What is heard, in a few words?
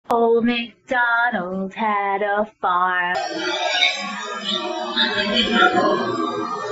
Old Macdonald